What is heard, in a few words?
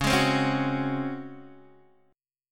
C sharp Major 7th Suspended 4th C sharp Guitar Open D